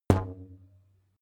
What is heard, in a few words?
rubber band pluck long